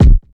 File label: dry bass drum one shot c key, steel kick drum tuned to the C note